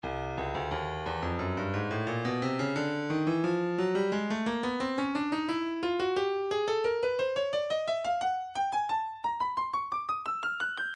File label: breakbeat 088 bpm; Stylus Breakbeat Midi Files 085 089 Bpm; Watts Towers A